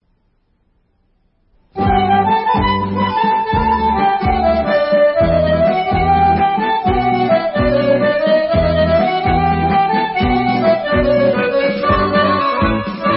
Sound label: Macedonian; Banjsko